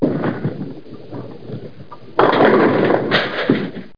Rattle

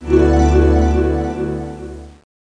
swell; The Pea; SoftKey

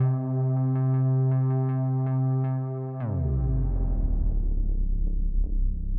sphere ambient 160

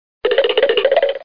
shakin

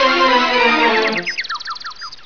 ball lost; Loony Labyrinth 1.02 Demo

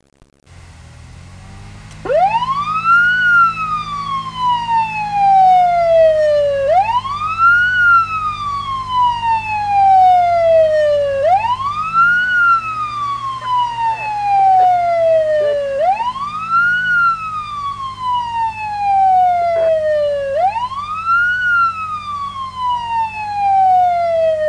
Siren; disaster; police

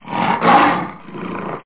lion; Java 1.1 Unleashed; jamba

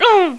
impact; QuickShot v1.0

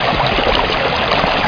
SEWER

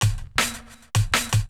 DRUMLOOP D+B 160, Drum+Bass Loop; eJay

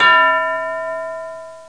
Ding

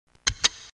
TYPING

